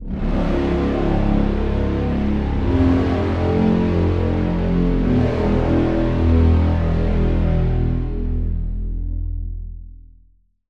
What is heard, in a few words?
Pad; The Haze; Routed Sound; Serum 2 Expansion - Doom Carpenter Vol.1